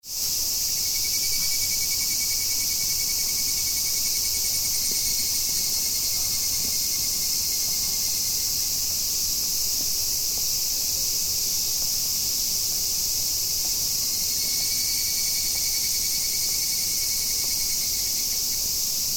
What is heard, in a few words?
lib